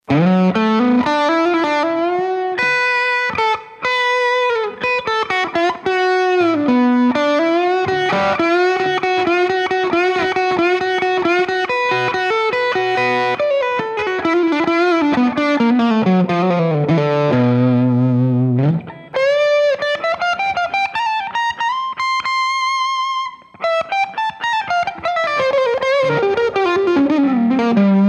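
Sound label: G-2 sustain at half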